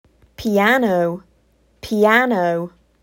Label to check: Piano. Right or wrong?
Right